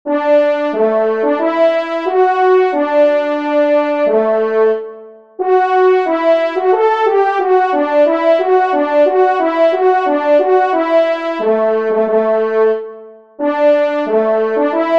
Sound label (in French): Retraite, trompe; Les Loisirs du Chasseur n°12; Pupitre 1° Cor; Henri Sénée